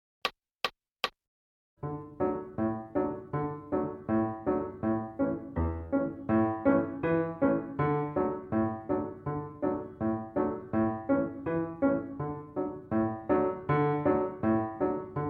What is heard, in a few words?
Piano; Bonaparte's Retreat